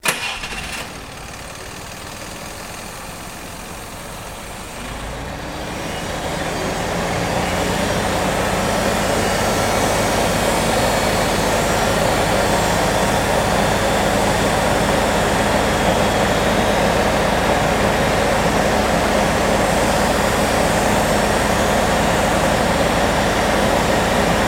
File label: Forklift Start Idle To High Idle Off